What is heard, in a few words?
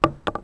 wood; Mafie 1